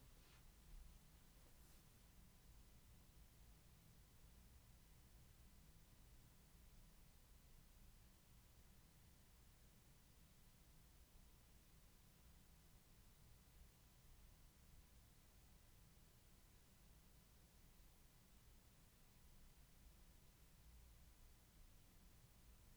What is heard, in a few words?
Studio Noise Floor